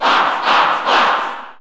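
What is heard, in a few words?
Cheer English, crowd; Fox; SSBU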